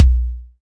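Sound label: Kick Electronic Long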